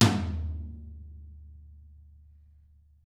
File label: DW 14 HIT, Double Platinum Drums; ILIO